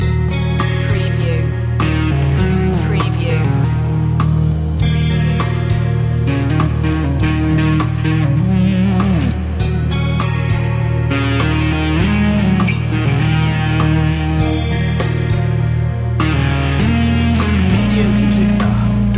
cloudy day loop

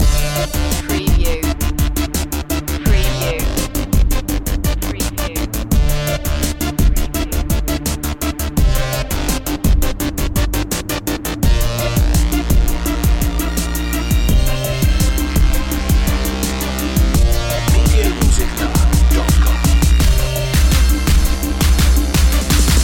dark synth loop

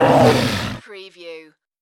creature vocal

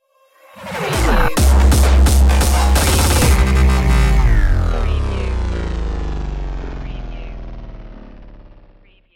destroyed stinger

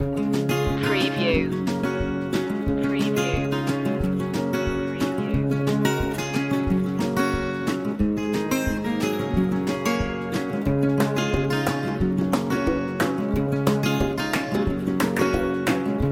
country road trip loop

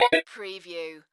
Multimedia Alert

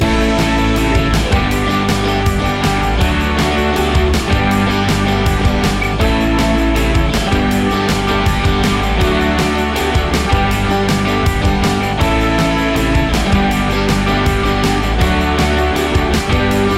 positive force loop

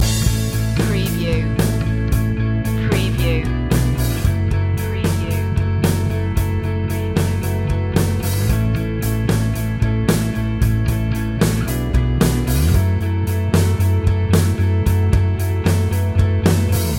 break down loop

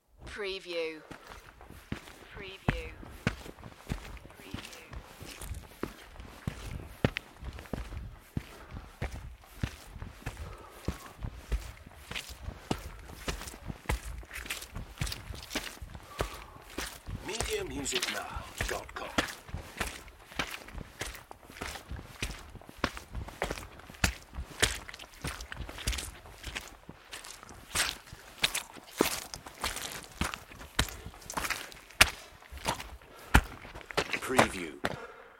Hiking footsteps and breathing; beanstalk audio

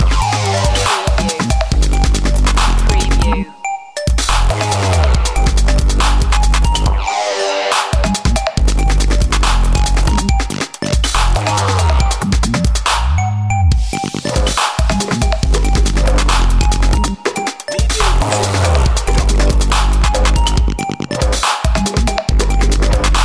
step 2 edge loop

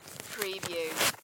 Baseball Glove Remove; sound effects bible

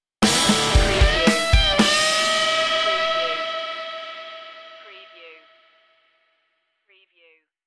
cruisin stinger

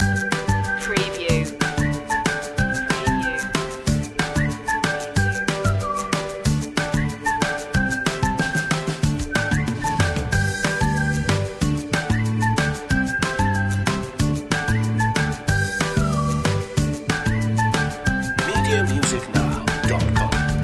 whistle beat loop

